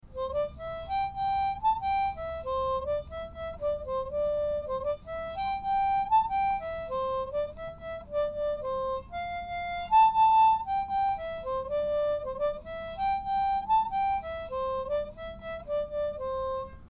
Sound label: harmonica country